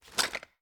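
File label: weapon assault rifle aim down sights up; gmod; viper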